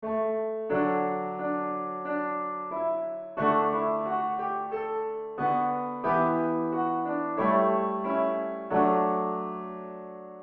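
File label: easy piano guitar lower key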